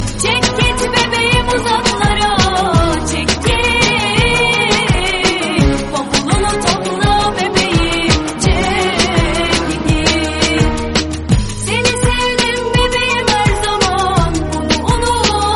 slow turkish

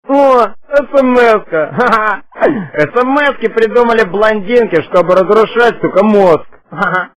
sms ringtone

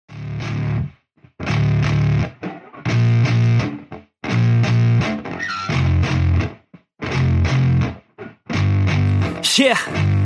thriller music ringtone

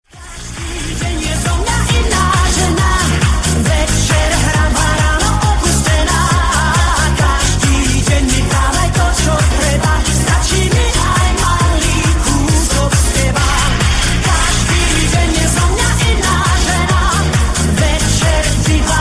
arabic, cool

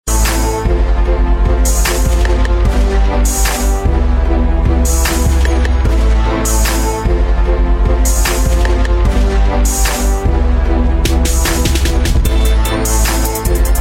hip hop beat

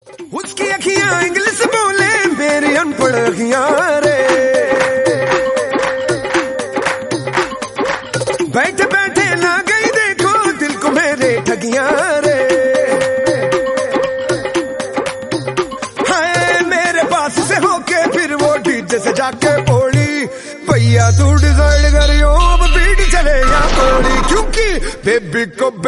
bass ringtone; sultan

